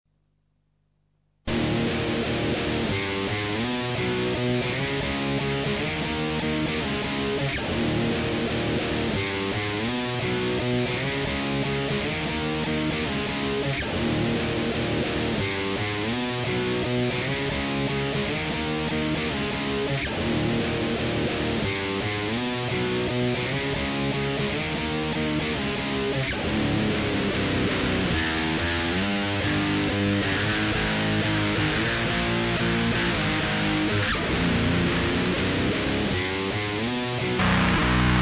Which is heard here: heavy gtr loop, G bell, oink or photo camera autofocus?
heavy gtr loop